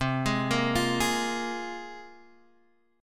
C 7th Suspended 4th Sharp 5th, C, down, Guitar, Standard; arpeggiated; C7sus4#5